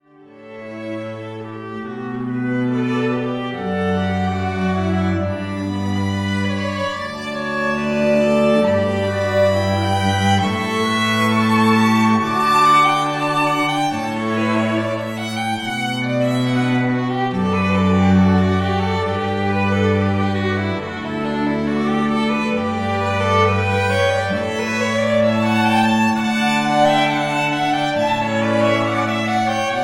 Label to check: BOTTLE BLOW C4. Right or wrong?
Wrong